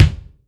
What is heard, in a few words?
B.D., AMBIENCE KICK